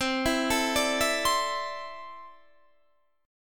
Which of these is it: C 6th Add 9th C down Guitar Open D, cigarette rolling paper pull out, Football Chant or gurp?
C 6th Add 9th C down Guitar Open D